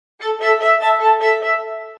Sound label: sms